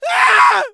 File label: Short Scared Scream